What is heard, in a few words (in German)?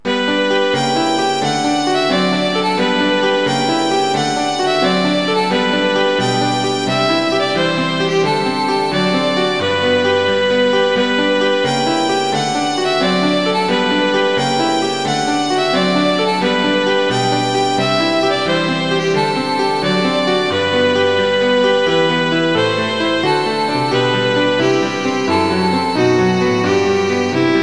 violine u klavier; kinderszenen